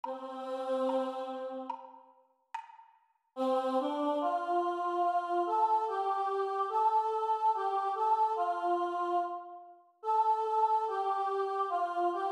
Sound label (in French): Chant Vx Seule